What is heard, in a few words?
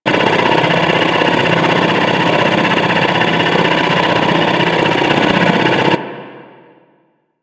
Hub Extractor Drill Loop; Tenno; Warframe; Ambience